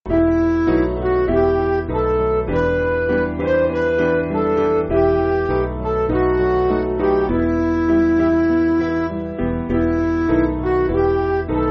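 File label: Midi, Music Backing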